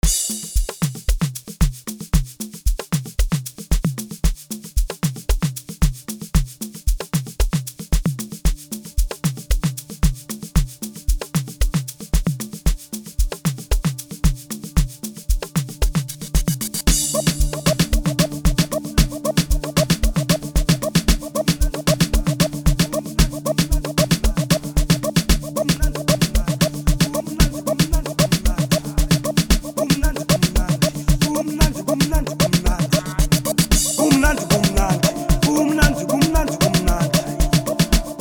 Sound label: Piano